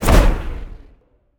Cast Arcane; smite; god merlin

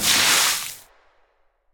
fire impact; smite; poseidon

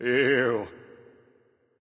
grunt ugh; bacchus

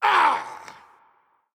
hitreact human male large

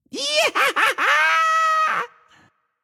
yay; smite; loki

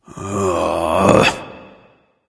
ugh; smite; zeus

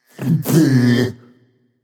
Tongue; smite; nezha

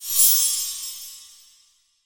sparkle; smite; chiron

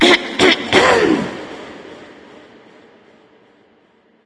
clear throat; smite; sol

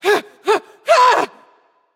fear; smite; terra

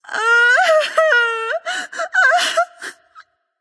boohoo light; smite; voicepack; hel

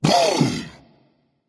tongue berserker; cuchulainn

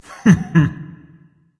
heh heh berserker; cuchulainn

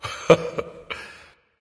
"heh heh"; smite; voicepack; ullr

